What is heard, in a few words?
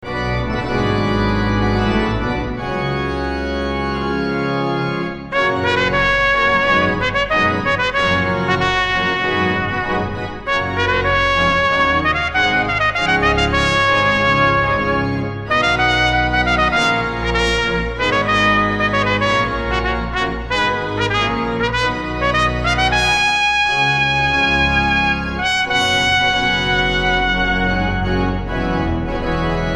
german ending